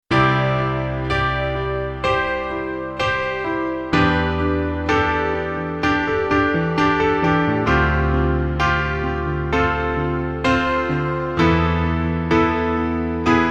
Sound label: pop piano